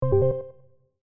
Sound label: arcade ui